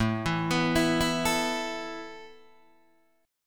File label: A Suspended 2nd A Guitar Open D